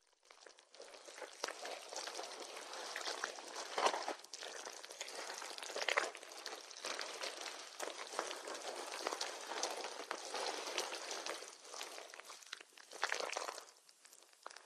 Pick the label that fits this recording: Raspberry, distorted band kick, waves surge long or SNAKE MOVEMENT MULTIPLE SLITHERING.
SNAKE MOVEMENT MULTIPLE SLITHERING